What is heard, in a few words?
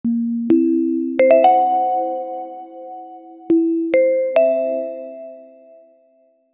a quick playful medium hi, fast decay